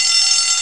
alarm bell

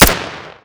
Mossberg 590A1; weapon

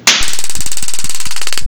Axon Taser x26; weapon

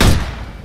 Tec 9 Pistol; weapon

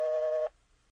busy tone, phone

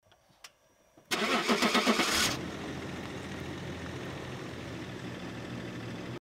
start engine

